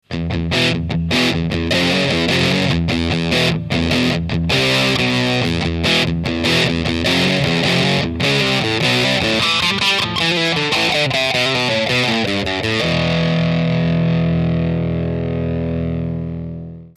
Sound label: Hard Drive Full Fulldrive